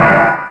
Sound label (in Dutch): Kloeng; Play&LearnRC